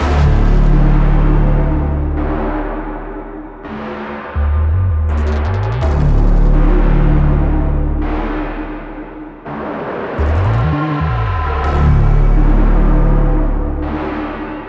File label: Ambience; deflector ps4 switch windows xbox one 2023